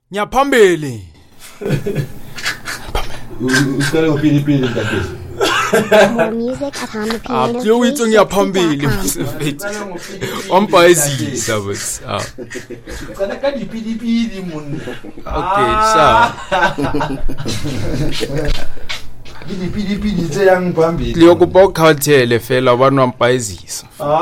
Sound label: kwaito